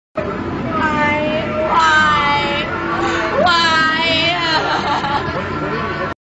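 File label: Nancy Kerrigan crying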